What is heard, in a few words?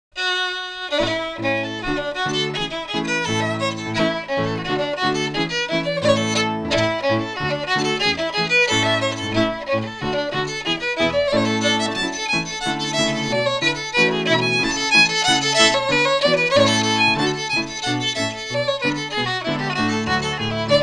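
Reel, Scottish Fiddling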